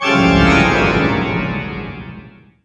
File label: Haunted Towers Organ; game rip; luigis mansion dark moon; 3ds switch